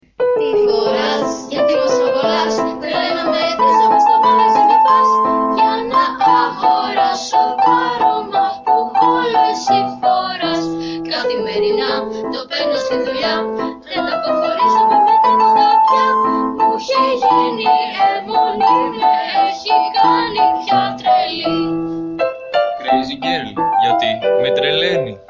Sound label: JINGLE